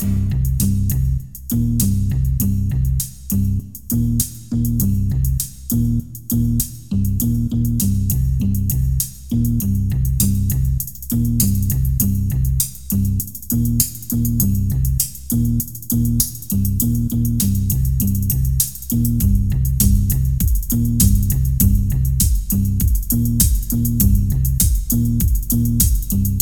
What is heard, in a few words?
AmbianceNappes